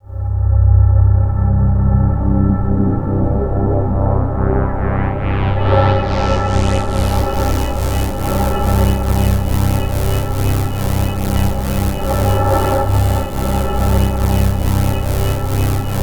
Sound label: sweep, ambient atmospheres